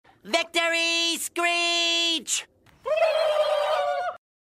victory screech

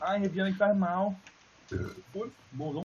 wolf

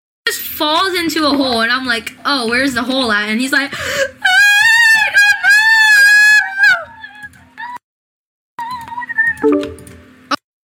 screaming

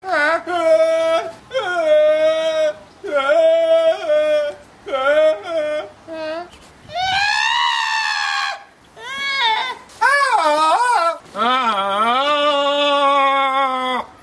goat cry

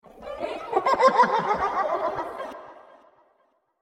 weird laugh

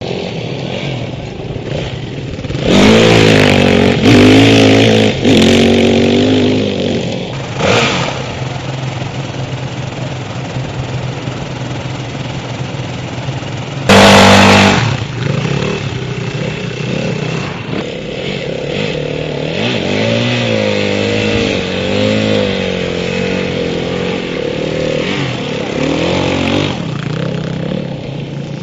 dirt bike sound

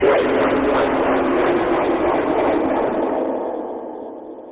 shadow jumpscare sound; after freddys

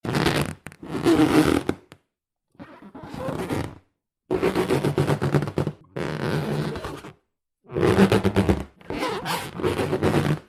balloon rubbing with hands sound effect stereo

